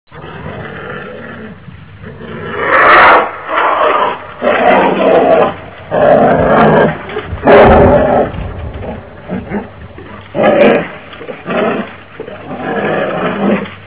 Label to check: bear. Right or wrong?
Right